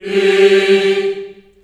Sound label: G3, Choir; USB Soundscan vol.28 - Choir Acoustic & Synth; AKAI